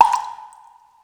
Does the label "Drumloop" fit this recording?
No